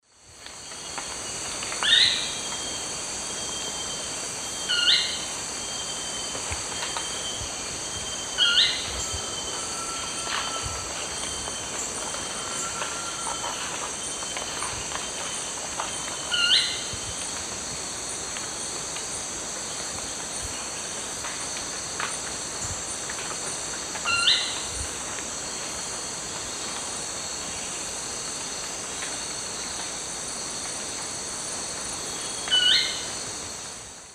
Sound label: Cnipodectes subbrunneus, song; S. America; Eastern Lowlands